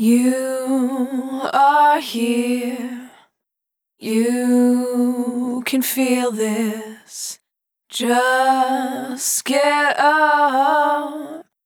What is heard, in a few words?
Multi-Voice Loops, Dry; Sonic Foundry (Sony Creative Software) - Pocket Diva World Class Vocal Samples